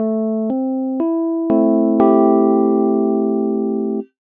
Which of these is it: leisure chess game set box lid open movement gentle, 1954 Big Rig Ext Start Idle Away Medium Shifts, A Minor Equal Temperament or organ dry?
A Minor Equal Temperament